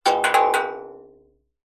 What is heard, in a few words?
foley metal thin object short rattle clatter larger metal frame